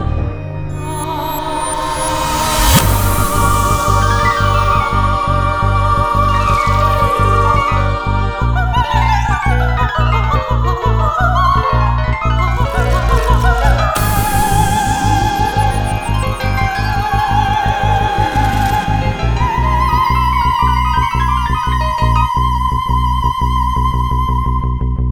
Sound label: bgm